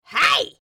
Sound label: Witch fantasy vocalization pain hurt